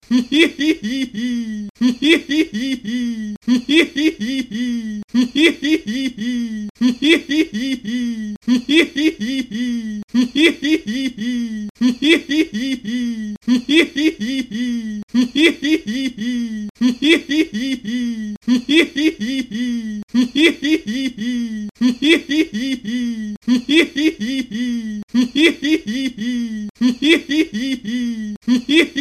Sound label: Laughing